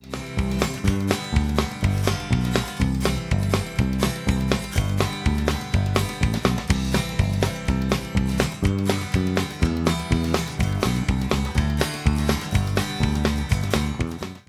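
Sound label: guitar good; audio examples from the book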